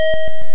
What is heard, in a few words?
get diamond; gnome stones